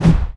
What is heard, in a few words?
death common flyers fall